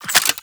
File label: Glass Shotgun Reload B; Warframe; Tenno